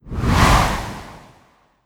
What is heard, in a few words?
Ice Attack Swoosh; Legend of Keepers